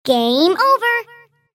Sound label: sound gameover